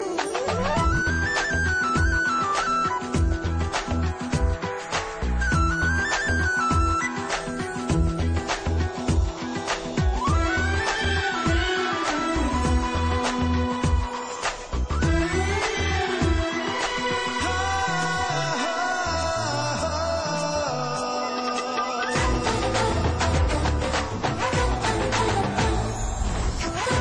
sony mix ring tone; ringtones

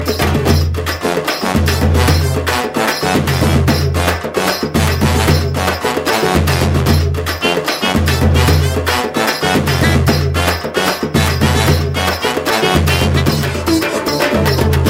folk

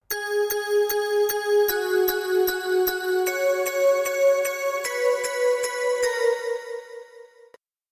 sms bell; ringtones

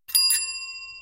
cycle bell; ringtones; sms

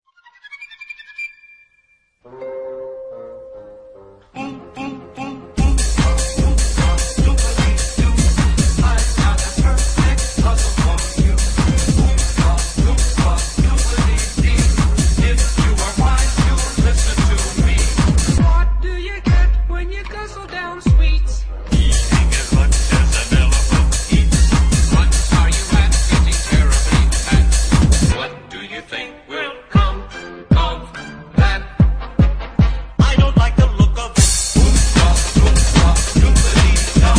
flute; oompa loompa

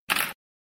Camera

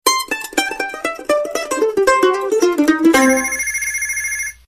fast beat; reggae

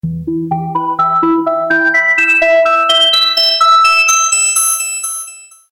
ascending tone

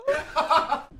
Laugh; Prop Hunt

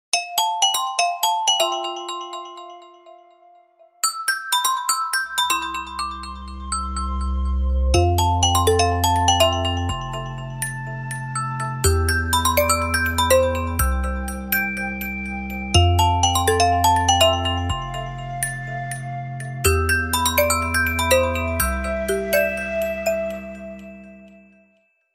Lumia 435 Tune; MSFT

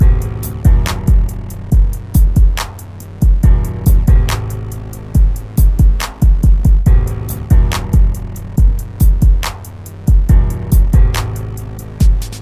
hiphopbeat

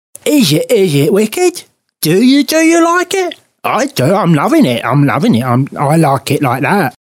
Suspense

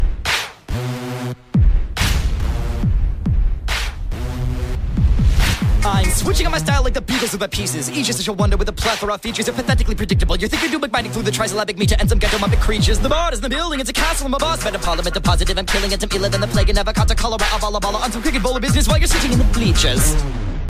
Rap

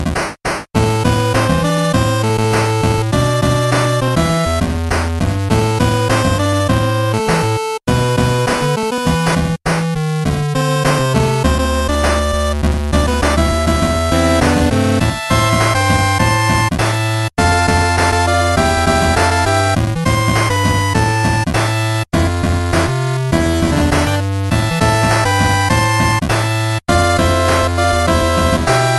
8 Bit

